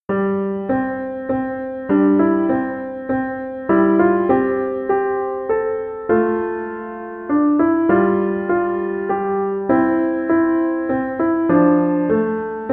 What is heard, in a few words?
away in a manger, piano